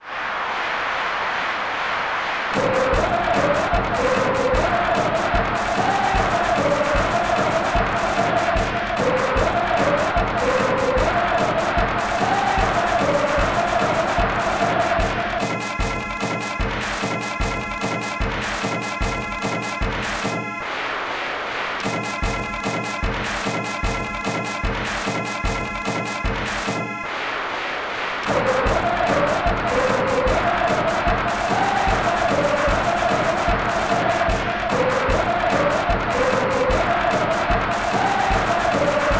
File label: Soccer Game Ambience